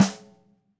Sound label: Ludwig Supraphonic Snare F Room; 1965 Ludwig Supraphonic Snare One-Shots; Sonic Foundry (Sony Creative Software) - Vital Drums